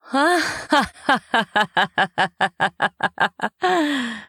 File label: Laughing; Anna